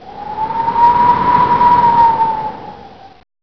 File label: whistle; match